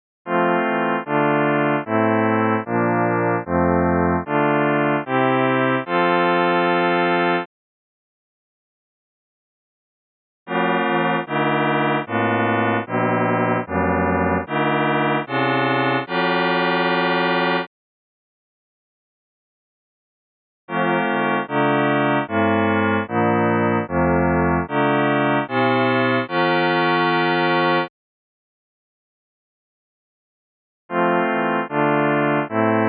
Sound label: Stretched Scale Partials